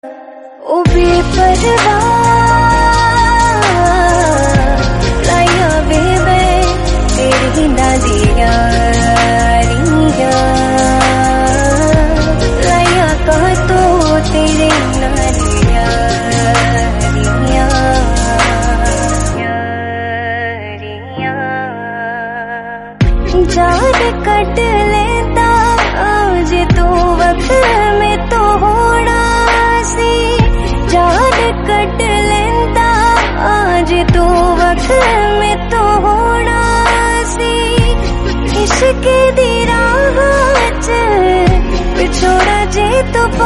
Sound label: panjabi; Bepar